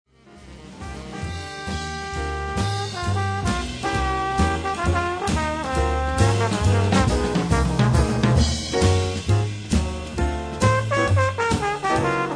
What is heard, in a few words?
rockslide